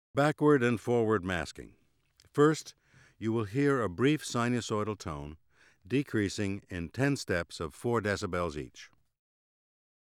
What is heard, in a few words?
Backward and forward masking, Auditory Demonstrations